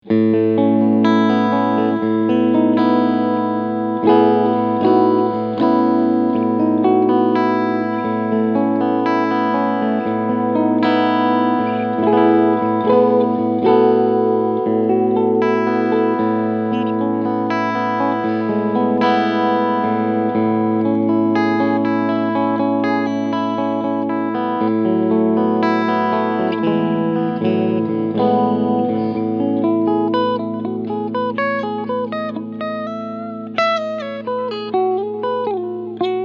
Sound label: Clean Position IV